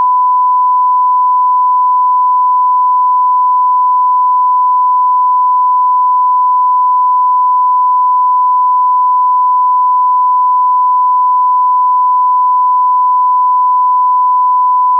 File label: sine 1khz stereo; 48000; sine signals